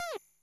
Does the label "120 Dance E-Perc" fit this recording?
No